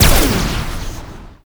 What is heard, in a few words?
Shock Rifle Fire